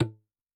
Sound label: Tabla; Yamaha DTXpress-II; Perc